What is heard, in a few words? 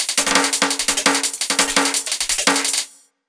EDM Drum Fill; FL PRO